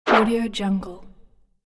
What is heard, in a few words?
throw item infographic swoosh element; cartoon